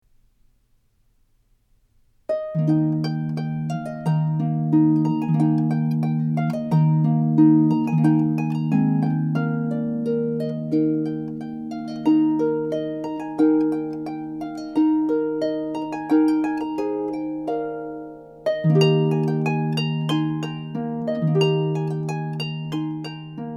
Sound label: Spring; Vivaldi